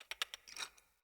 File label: Foley Winch Release; Enemy; Nimrod